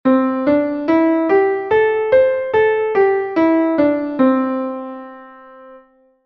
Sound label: Major Pentatonic (19-EDO)